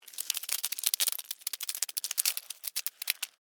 Spider Walk Move, Animal, Spider, Walk, Movement, Arachnid, Insect, Bug; Spiders